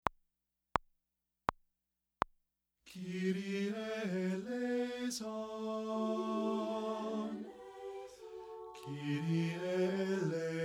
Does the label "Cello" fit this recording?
No